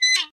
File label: Button Out; Dino Donkey Dash